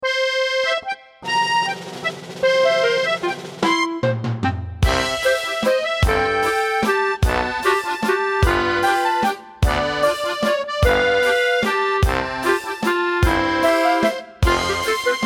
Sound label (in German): Volksmusik